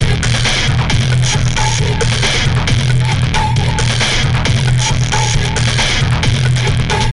Alien Battle; Lego Battles 2009 NDS

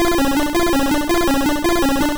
Psybeam Loop; sfx; attack moves; pokemon; gen 2; gsc

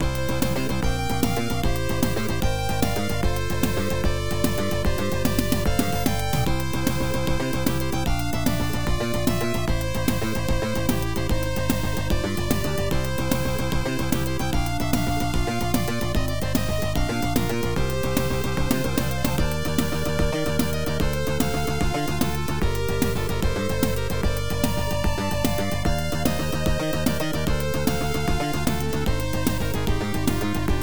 Score Ranking; hotdog storm arcade

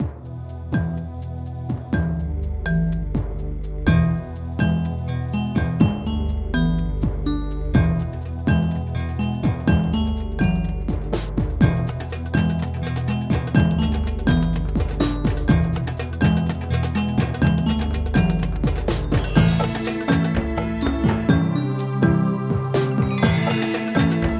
Music Loop; Digging for Dinosaurs; NDS

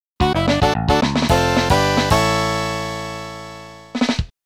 I Won (Player Won Fanfare); Ganbare Goemon Sound Treasure Box

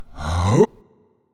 SFX argh; wickerman

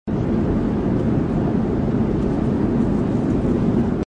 Jet; Learn to Fly

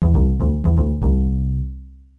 Bass; lego my style kindergarden

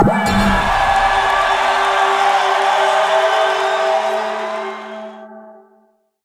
UI Gilded Palette Reveal

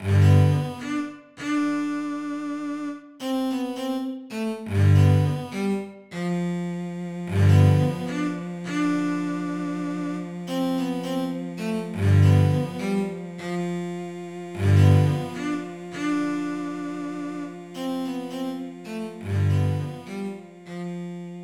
Music For Solo Violin Cello; Azure Dreams 1997 PSX

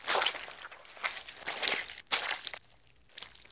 Mopping Floor

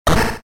Flag Get Fanfare; Carl and the Ultimate Sandwich 2023

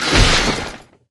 attack; endless world idle rpg gamerip 2019

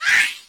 Monster Bee type Death

